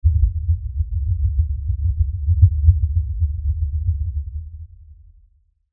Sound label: Impact Lfe Rumble; Impact Lfe Rumble Long; Low Impacts (Sound Effects:Impacts); Blastwave